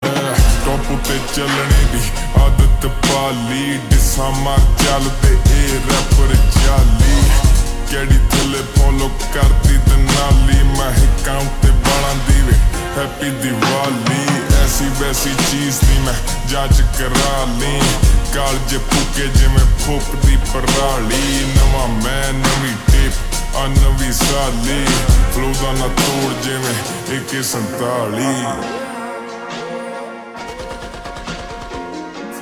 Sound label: Slowed Reverb